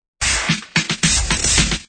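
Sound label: start, Corporate Identity Intro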